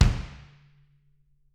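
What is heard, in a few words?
bass drum; Bob Clearmountain Drums I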